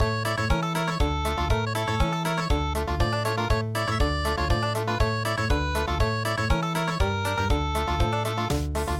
Country; dont touch handkerchief

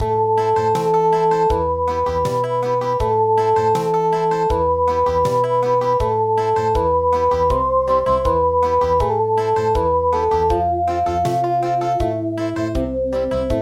Country, midi; Sakura

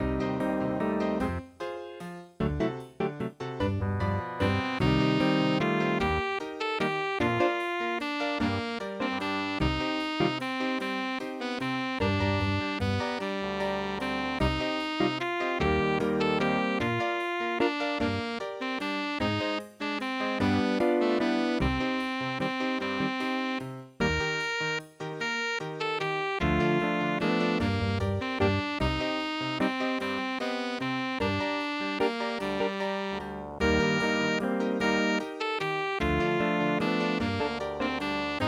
jazz waltz

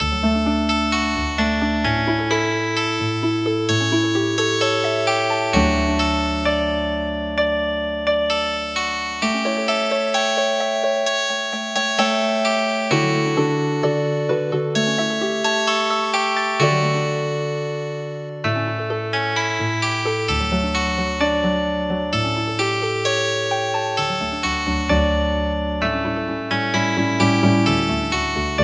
Healin Harp; Hinten bei der Stadltur

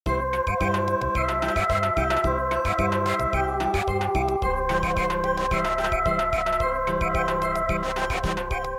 Samba

